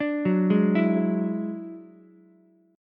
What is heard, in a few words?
Gb 7th Suspended 2nd Sharp 5th, Ukulele; arpeggiated; JGuitar